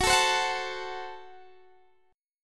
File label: F sharp Diminished F sharp, Guitar Standard; F#dim strummed